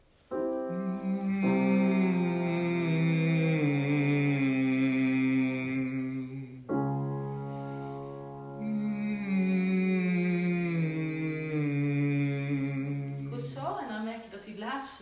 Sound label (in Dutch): voorbeeld dalend